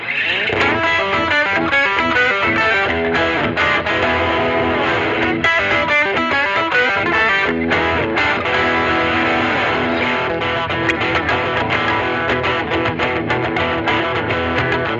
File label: Electric Redneck Guitar Only